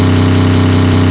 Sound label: ENGINE; Carmageddon Demo